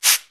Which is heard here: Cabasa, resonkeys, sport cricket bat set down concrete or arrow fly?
Cabasa